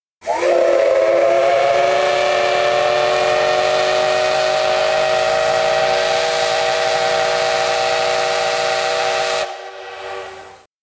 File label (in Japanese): SL kiteki